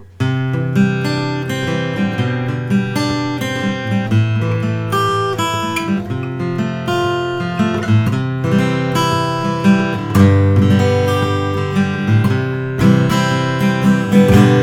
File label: collings 000 2ha custom natural